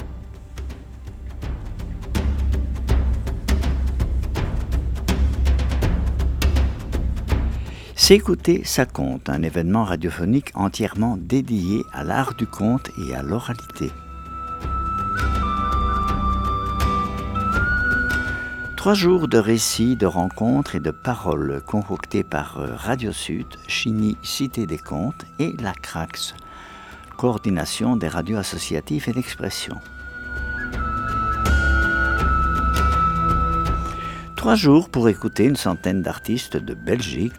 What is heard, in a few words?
jingle